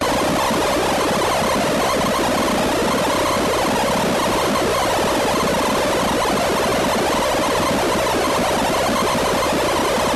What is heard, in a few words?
science fiction scrambled message unscrambled tone loop; Skyclad Sound